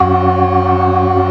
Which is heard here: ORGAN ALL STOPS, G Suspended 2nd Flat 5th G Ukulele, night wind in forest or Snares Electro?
ORGAN ALL STOPS